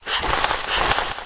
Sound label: skate